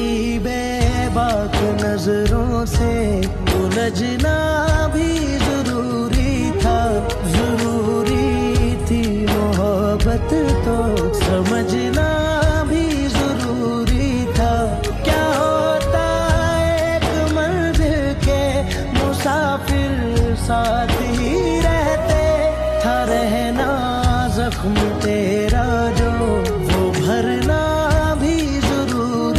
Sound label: Ringtone